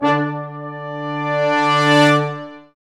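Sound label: Sections, Brass Large Ensemble sfz; Roland LCDP06 Brass Sections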